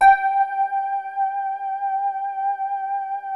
NYLO SOFT G4; Music Store - Claudius Diemeter Schwerlos

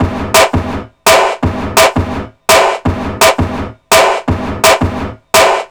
BREAK, Explosive Jungle; USB Soundscan vol.05; AKAI; 90s sample CD

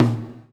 GATE TOM, Drums Acoustic

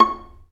Vla Piz C#5; Multisamples; Digital Sound Factory Virtuoso

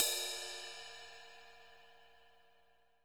CRASH 2 TIP, drum; 90s sample CD

